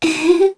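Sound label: Vox Laugh, Korean; Kara; kingsraid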